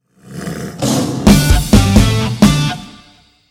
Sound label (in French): Logo Sonore